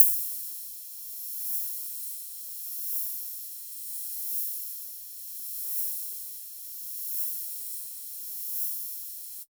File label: TR-808 Cymbal Loop; Roland BK-5